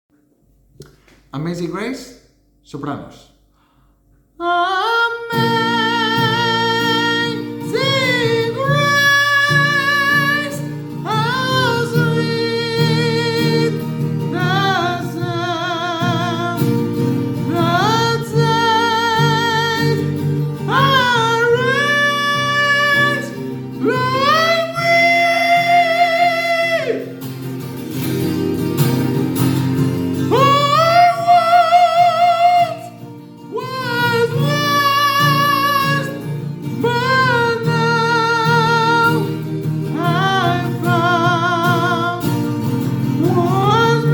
soprano; amazing grace

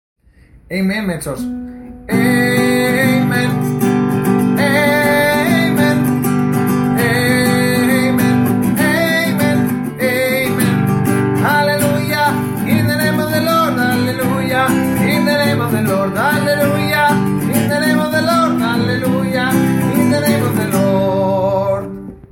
amen voz mezzo

